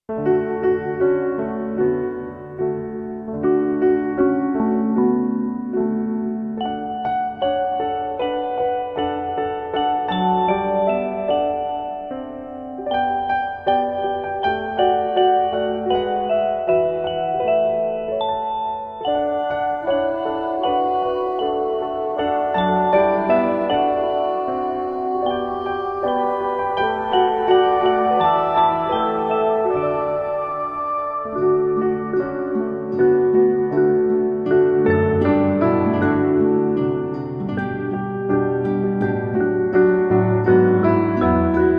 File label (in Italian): jingle bells di natale